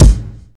2000s crispy kick sample g sharp key, kick drum sample tuned to the G# note